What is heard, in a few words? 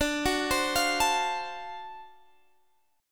D 7th Sharp 9th D down Guitar Standard; arpeggiated; JGuitar